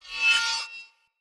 Short Sword Whoosh Accent Heavy; Weapons; Player; Swords; Sollos

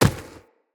Infested Medium Run Dirt, Footsteps

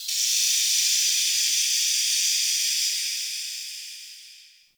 Relay Steam Blast

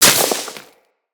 Melee Impact Dirt, Weapons

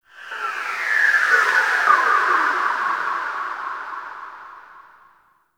gas city distant wind textures; Warframe; Corpus Gas City; Ambience

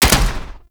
SMG Alt Shot; Warframe; Tenno; Sundial SMG; Weapons

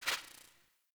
UI Theme Dialog Close; Zephyr

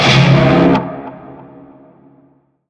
Shawzin Phrygian Chord; Warframe; Narmer Shawzin

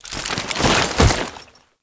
Prone To Stand; Lancer; Warframe; Lasrian Soldiers; Foley; Stance

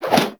Melee Swing; Warframe; Caliber Chicks Minigame

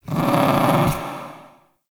Ambient Meow; Characters; Warframe; Kalymos

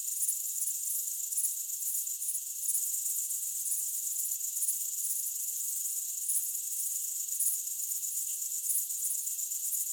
Night Insects High; Ambience; Props; Warframe; Eidolon Plains

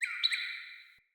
Cardinal Female Bird Double Chirp

